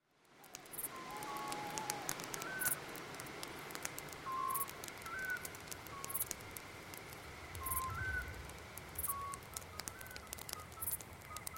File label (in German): Geraeusch- Nachtatmosphaere mit Feuer, Wind